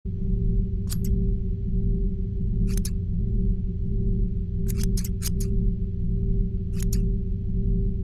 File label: Walk